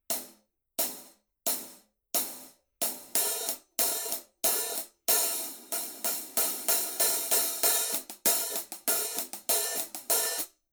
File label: 900 series 14 hi hat pattern